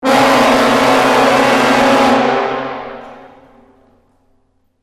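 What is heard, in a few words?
Sting Large Brass; dead space 3 ps3 windows xbox 360 gamerip 2013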